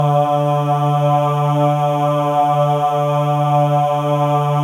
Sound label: MALE AHS, Voices; Spectrasonics